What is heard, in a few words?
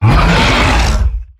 Exertion Heavy; Evolve; Behemoth